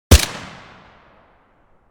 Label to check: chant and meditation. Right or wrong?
Wrong